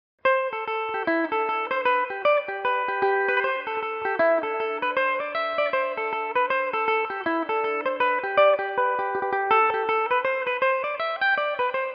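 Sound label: A dorian belmuse, irish mandolin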